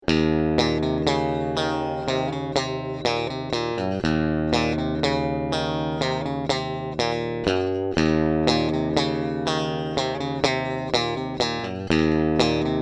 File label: Rev + Trem Vibroverb unproc; fender